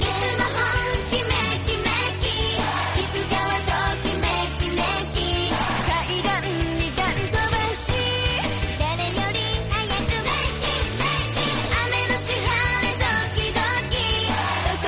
cute japanese song

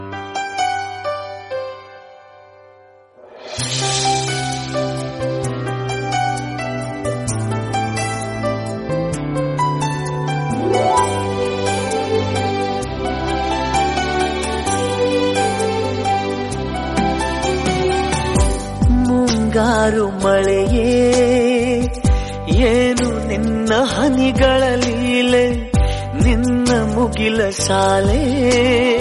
male; mungaru male

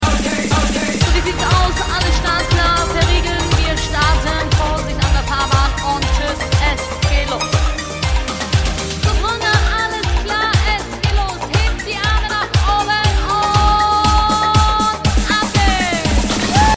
german mix

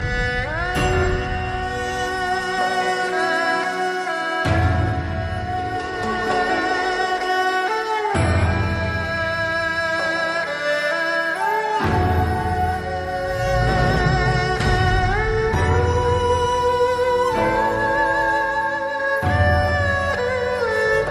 tai chi meditation ringtone

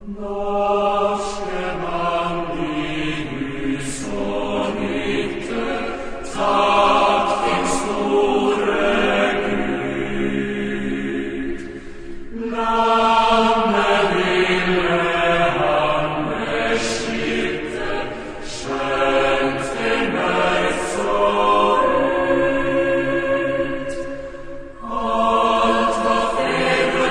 anthem ringtone

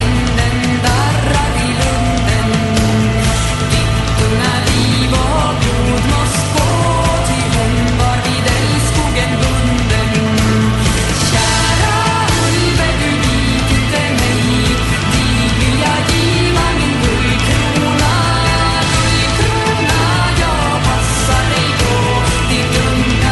ballad ringtone

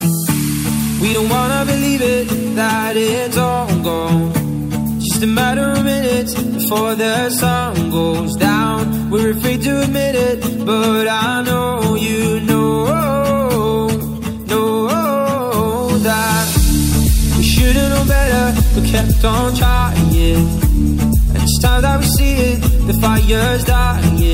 english best ringtone

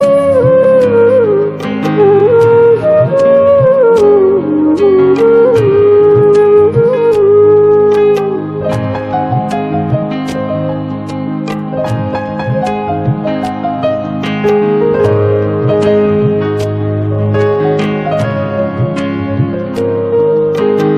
flute srilanka

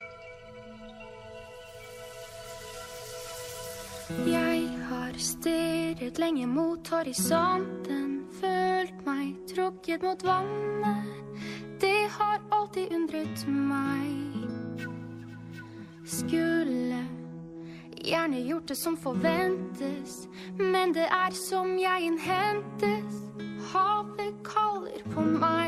norwegian ringtone

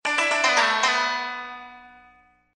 chinese message ringtone

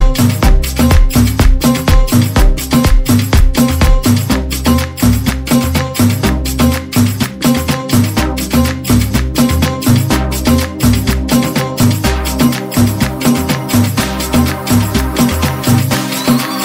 moroccan vibe mix ringtone

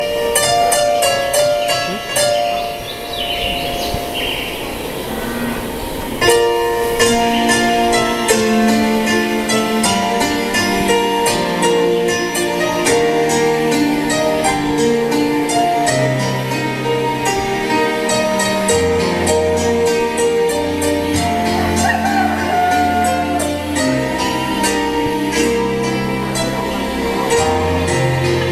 slow latin music ringtone

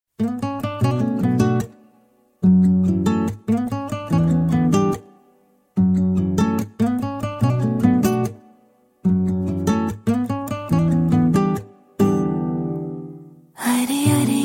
background ringtone

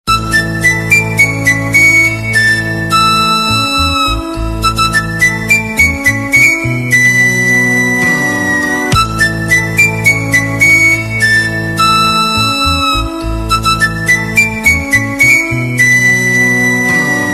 flute, ringtone; koyla

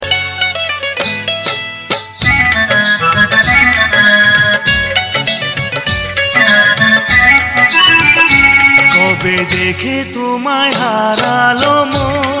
bengali ringtone; ddlj

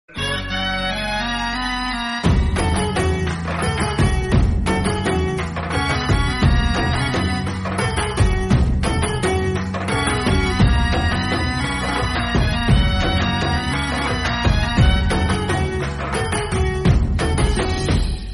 music, ringtone; munna bhai

